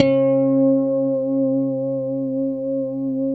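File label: D4 TAP harmonic, guitar; Spectrasonics; Hans Zimmer Guitars VOL-1; JC-TAPONICS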